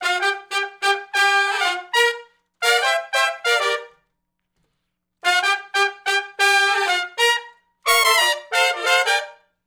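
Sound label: R&B Riff (Gmin) unison to harmony; Sections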